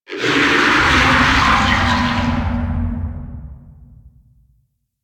Kraken Vocal; Warframe; Warframes; Pirate

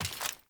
Steps

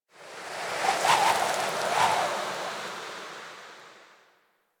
Wind Gust Medium, Ambience Plains; Warframe; Eidolon Plains; One Offs

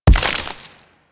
Nechro Mech Step Surface Foliage; Step Surfaces

